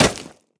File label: step run, footsteps player debris